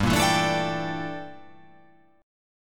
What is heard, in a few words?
G Minor 6th Add 9th G down